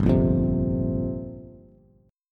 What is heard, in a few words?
G Minor 11th, Bass, Bass Standard; strummed; 3,1,3,5; JGuitar